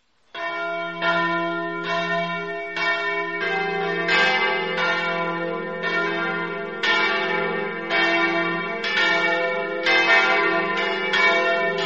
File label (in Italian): campane